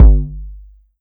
Kick Dist Soft 808

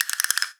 Perc Castanet Roll

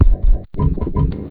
industrial, pitchglitch loop

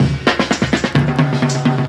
Tom Roll n Break; Drum Digger

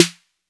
Snare Soft TR808

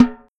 Snare Alt Off

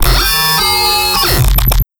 Bass Atenthion

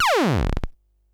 bleep

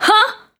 Vocal "Huh"

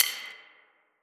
keys; foxdot default

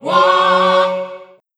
Vocal Choir Wahhh

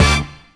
flared funk

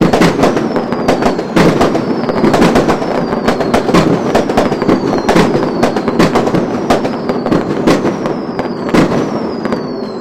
fireworks

